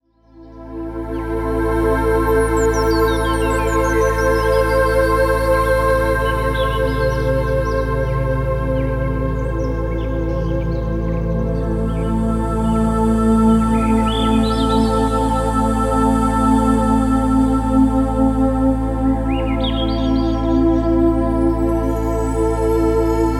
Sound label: Music for Reiki and Meditation